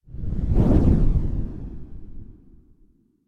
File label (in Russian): zvuki s effektom peremeshcheniia videokamery